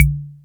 KORG SR120

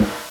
SNARE, NES DPCM

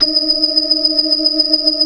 BLOOD OCT R, ORGAN; Keith Emerson Signature Series

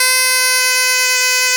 BOMB2 C3, BOMBARDE2, Celtic Flavours; USB Soundscan vol.42; AKAI

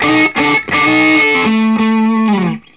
Vintage Blues Guitar, SOLO

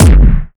DUTCH KICK; Masterbits; Soniq Elements

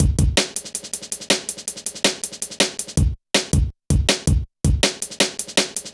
Kicker, Jungle, Drum Loops 161.5; Zero-G Jungle Warfare 1, 2 & 3

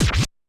Korg 01W Dance Kit; 300 Drum Machines

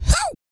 YELL, MALE HUMAN; Spectrasonics Vocal Planet

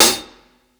60s HH LOUD, HI HATS; Basement drums vol 2

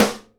snare GLASS, Live Snares

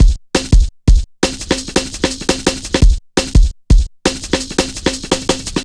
170, Drum Loops, Drum & Bass

